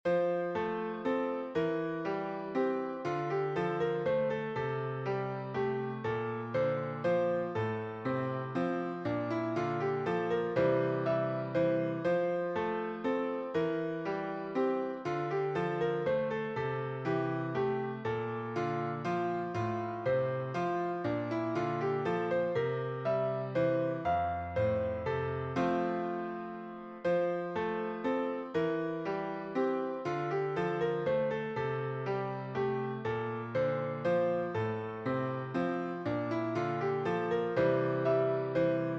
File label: Minuet F Piano